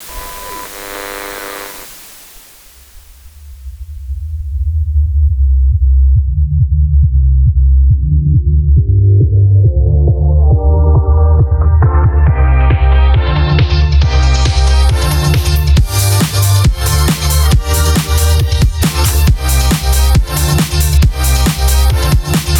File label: Prelude (Instrumental); Friday Night Funkin mod